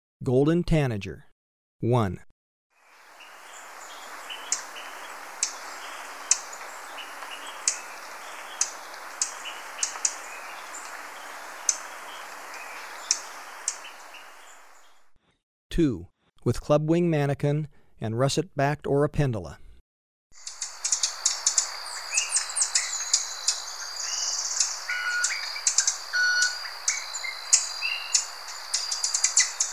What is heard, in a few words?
Golden Tanager, Birds of Ecuador; S. America